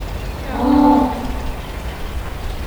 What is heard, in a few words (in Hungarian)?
papaszemes pingvin; marantz puska; okulare; debreceni zoo 2012